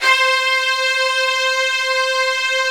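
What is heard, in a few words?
C3, major minor violin trill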